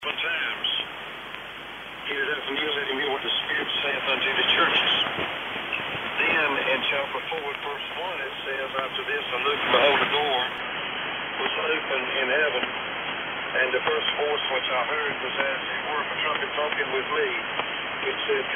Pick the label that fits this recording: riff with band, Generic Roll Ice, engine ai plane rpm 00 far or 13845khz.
13845khz